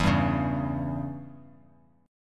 E Minor 6th E; Em6 strummed; JGuitar

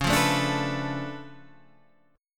D Minor Major 13th, C sharp

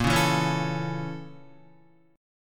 A sharp Minor Major 7th Flat 5th A sharp Guitar; A#mM7b5 strummed; JGuitar

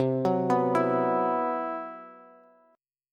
C Major 7th Flat 5th C, Bass; CM7b5 arpeggiated; JGuitar

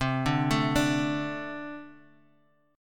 A Minor Double Flat 5th C

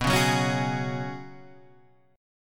Gb Suspended 2nd Suspended 4th B

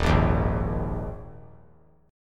D sharp Suspended 4th; D#sus4 strummed; JGuitar

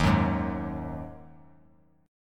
D sharp Minor Double Flat 5th, Bass Standard; strummed; JGuitar

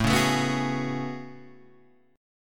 A Major 13th, down Guitar Half Step Down; AM13

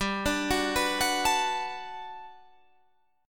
G Major 9th, G, down

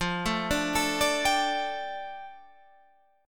F 6th Add 9th F, chord

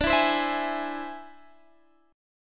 E Augmented 7th D

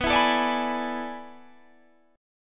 A Major 7th Suspended 2nd Suspended 4th

